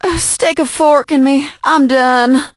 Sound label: die